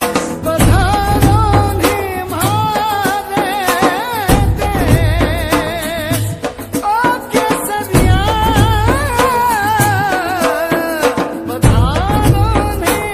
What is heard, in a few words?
rajasthani ringtone